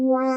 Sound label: Violin